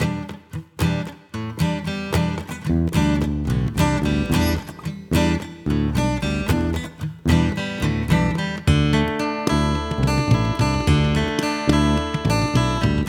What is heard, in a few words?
jam tracks phrygian